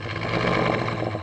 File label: ambient alt